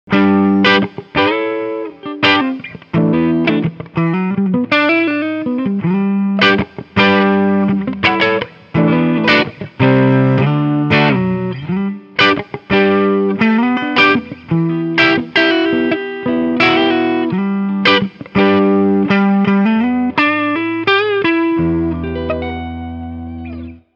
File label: Clean channel Picking